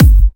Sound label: Kicks; Vengeance Samples